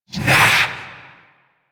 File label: Possessed Vocal Attack; Warframe; Priest Quest; Possessed Red Veil B